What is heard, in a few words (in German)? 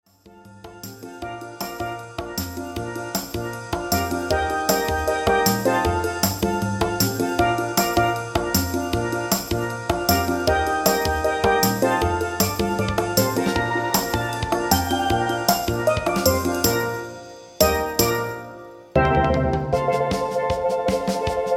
mundart medley